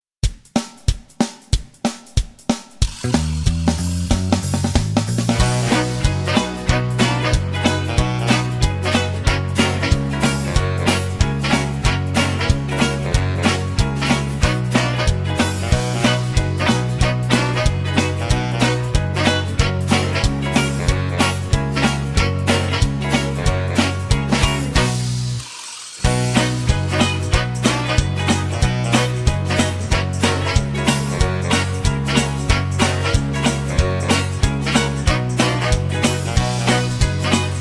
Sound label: B To C No Backing Vocals, backing tracks